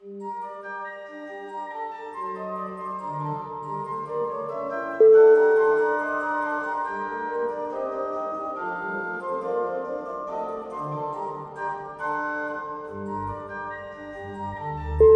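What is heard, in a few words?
beautiful baroque, music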